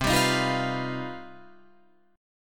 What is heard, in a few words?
C Minor Major 11th C down Guitar Drop D